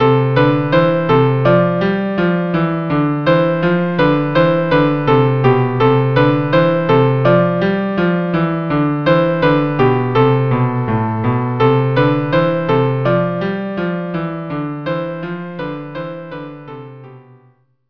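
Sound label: violin cello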